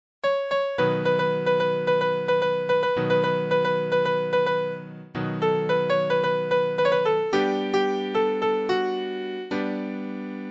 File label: Piano